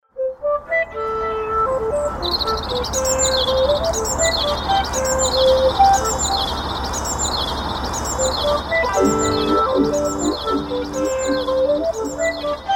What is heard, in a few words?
ring